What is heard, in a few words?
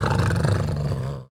angry dog